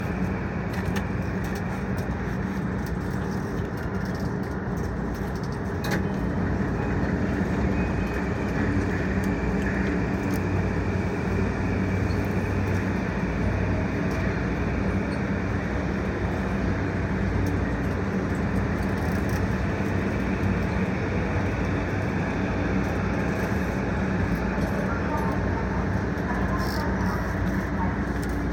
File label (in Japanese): JR総武線快速稲毛西